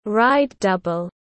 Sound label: ride double